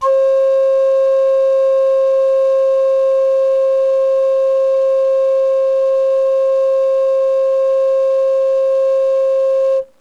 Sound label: pipe